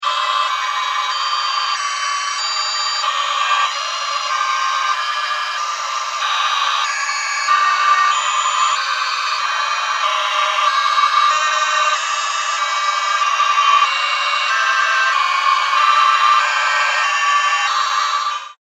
SciFi Spaceship Radio Bad Connection; Communication; Weird Alien Noises; Crafted Signals

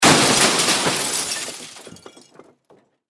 Impact Crash Metal Glass Rock; Materials

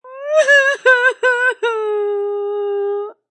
Female Sob, A female sobs or cries; Crying (Sound Effects:Voice)

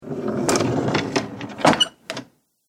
Old Wooden Drawer Close

